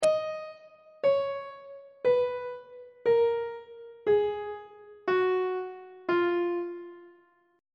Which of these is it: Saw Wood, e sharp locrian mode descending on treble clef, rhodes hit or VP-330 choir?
e sharp locrian mode descending on treble clef